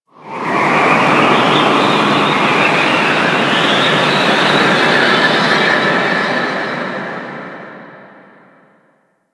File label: Moon Space Wind, Ambience; Warframe; Orokin